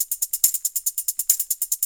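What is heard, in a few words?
tambourine loop 140; Best Service Alpha Dance vol.2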